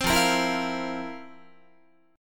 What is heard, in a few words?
F Major 7th Suspended 4th F, Guitar; strummed